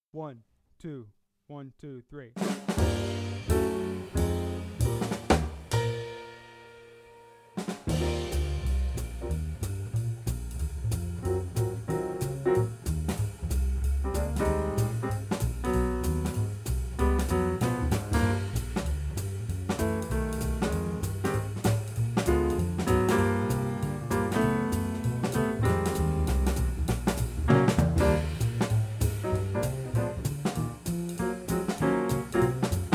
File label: Play-Along, Saxophone; Jazz Conception For Saxophone; 21 Solo Etudes (Eb Saxo)